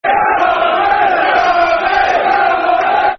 Red Army [Football Chant (Soccer Song)], Southampton